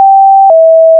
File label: long tx permit